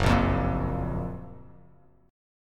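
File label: G flat Major 7th G flat; GbM7 strummed; JGuitar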